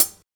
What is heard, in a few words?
Hihat